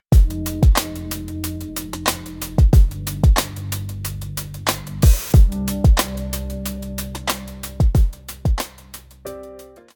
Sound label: homepage intsrumental